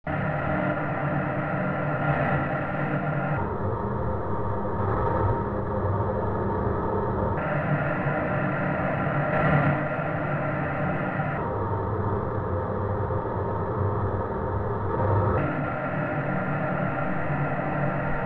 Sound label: White Noise